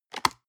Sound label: plastic box food small open hard